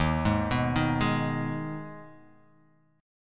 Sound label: Eb Suspended 4th Sharp 5th; Ebsus4#5 arpeggiated; x,6,6,4,3,3,x,x; JGuitar